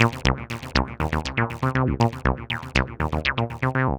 303 loops; Sonic Foundry (Sony Creative Software); Ugly Remnants VOL-2

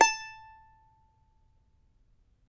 CHARANGO

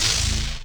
technosyn2, Techno Trance; Ueberschall; SYNTH

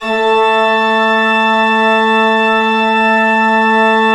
A2, Cathedral Organ; ILIO; Propeller Islands Cathedral Organ

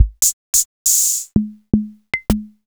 Farfisa Rhythm10

